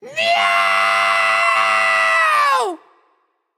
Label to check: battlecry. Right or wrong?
Right